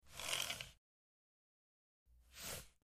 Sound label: Short Mouse Slides